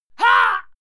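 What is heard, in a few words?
female fight pain heavy, screams; schreie; menschen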